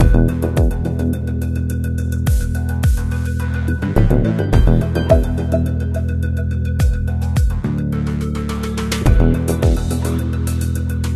Menu Loop; Rival Wheels; Android mobile

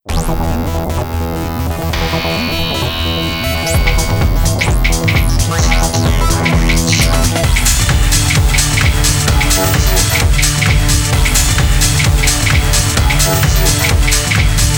AM-3P (303 BASS MIX); Dance Dance Revolution Party Collection

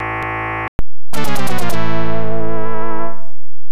Education - Wrong Answer; Adventure Tsukuru II; PC-98

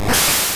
Slash; sfx; attack moves; Pokemon; gen 1; rby

